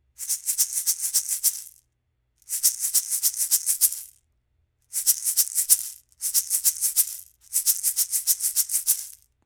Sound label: Neumann TLM 102 Shaker UNCOMPRESSED